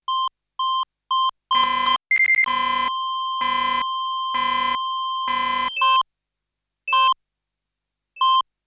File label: EKG, EKG SOUND; Total Control - Action Cutz